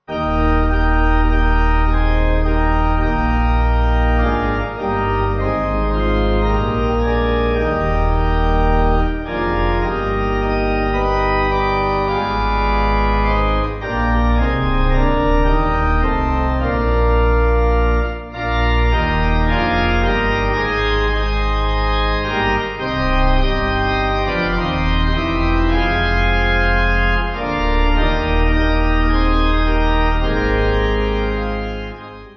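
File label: Eb Pipe Organ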